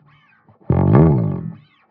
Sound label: Bass Slide, 125bpm; Zero-G; Nu Disco; Extra Bass Slides